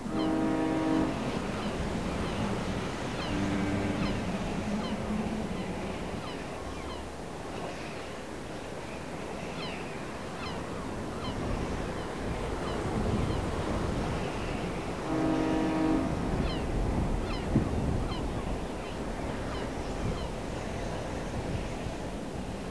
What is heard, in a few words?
Amb; WarRock; Ambient; Bandar